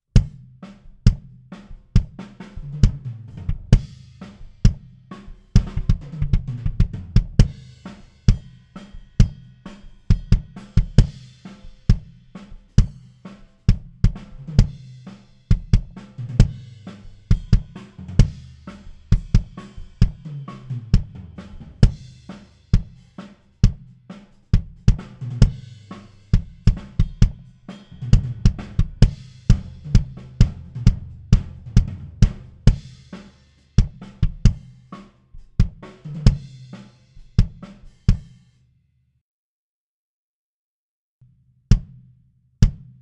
kick

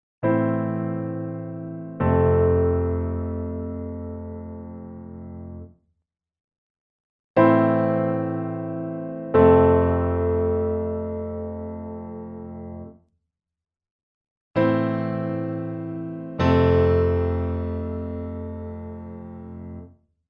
Nord Stage 3 EQ Presets Piano Soft Mid High

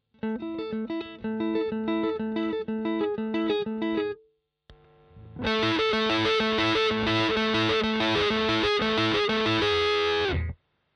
Gain max Some Riffs; BM20 ULTRA

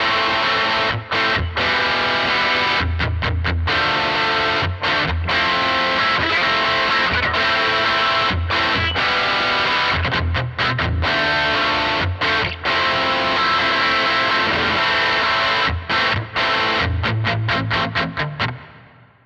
Nux MG-30 Super Reverb Rat Distortion

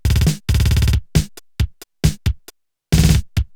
Loop; MI GRIDS SLD IDUM

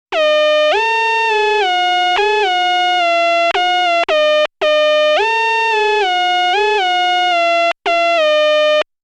MS-20 Westcoast Lead; MS-20 Patches

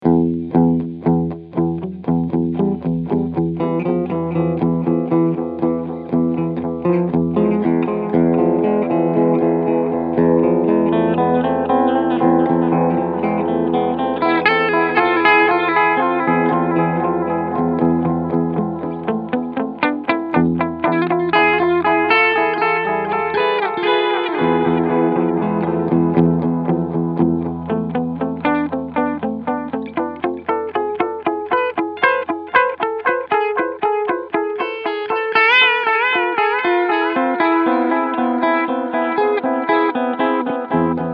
Keeley DDR Delay Pur Medium Time

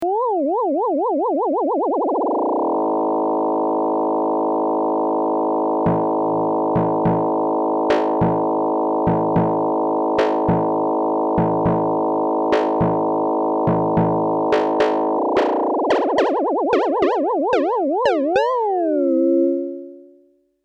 EXP DRONE

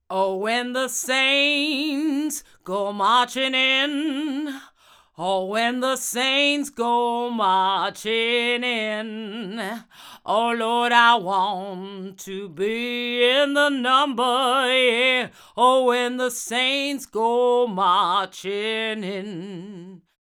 Vox saints omni GA 47

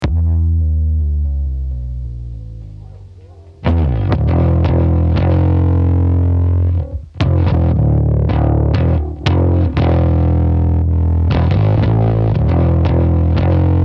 clean bass

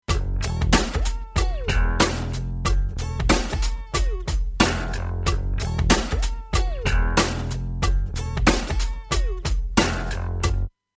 Neve 4081 Funkloop; AMS

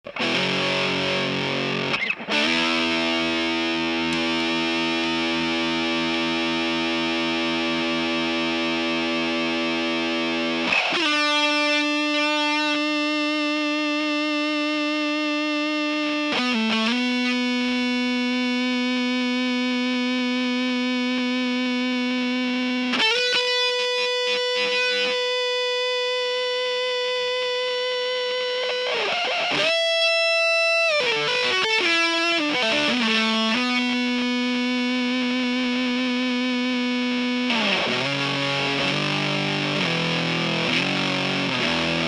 Fuzz God infinite sustain

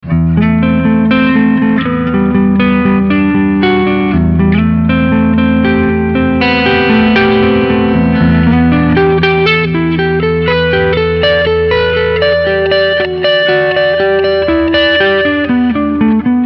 Cleansound Front HB

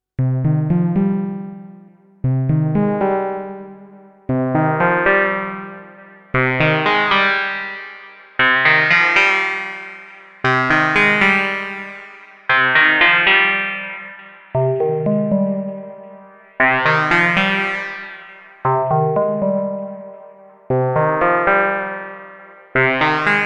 Mayer MD900 working with shaper

